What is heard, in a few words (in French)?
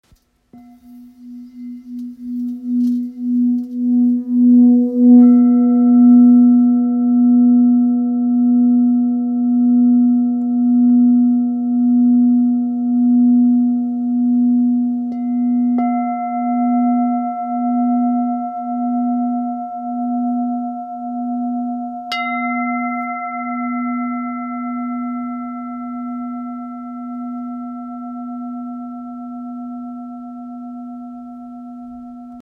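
Bol thick si, bol chantant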